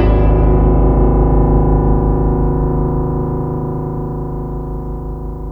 dark piano sustain; Sonic Foundry (Sony Creative Software); Ugly Remnants VOL-2

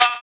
Fx Vox; Equipped Music; Loungin House

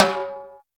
Timb; Roland R-70; Perc

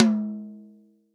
TOM, Real Drum Kit, POP KIT; Best Service ProSamples vol.43

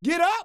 perc voice, Roland MC-909

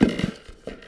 Thunder Drum

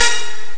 trumpets; Amiga Soundtracker Sample Packs

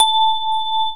SYNTHESIZER DIGI DECAY; AKAI S6000 CD-ROM - Volume 1

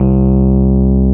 piano Rhodes

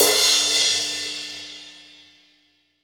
CRASH, OLD ZIL CRS

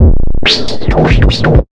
150, Big Beat Drumloops

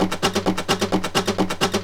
130 addon, DRUMLOOPS, HIHAT

